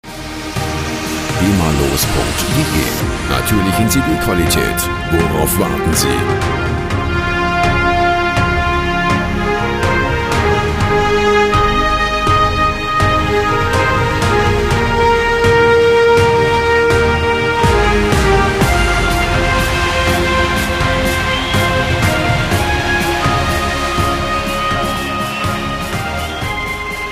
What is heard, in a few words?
live rave